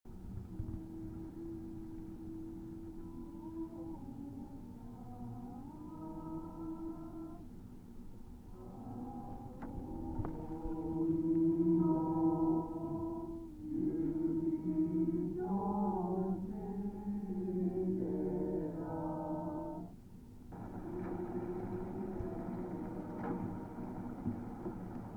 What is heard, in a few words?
Silence